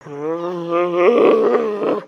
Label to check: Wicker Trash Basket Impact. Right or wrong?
Wrong